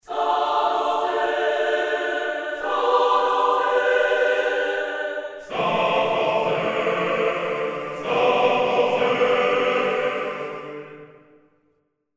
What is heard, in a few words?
marcato